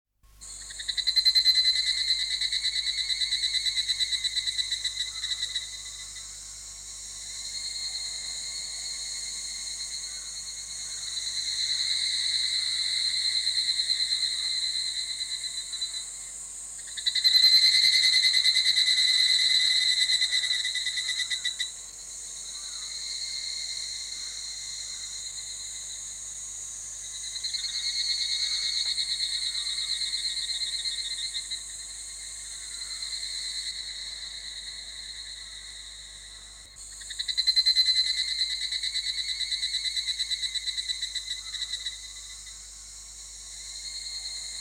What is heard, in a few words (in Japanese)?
ヒグラシ